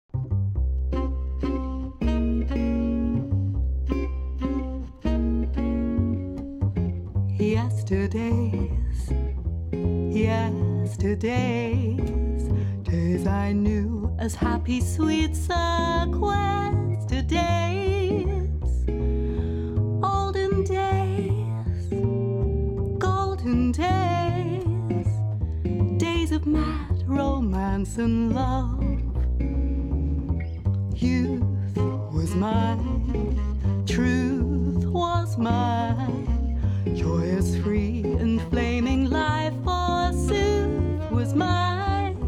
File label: Jazz Band, Latin Jazz Band